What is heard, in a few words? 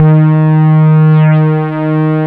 ALLROUND D#2, LEAD SYNTH; Best Service Alpha Dance vol.2